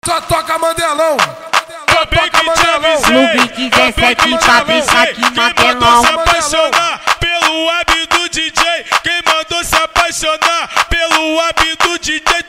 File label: especial funk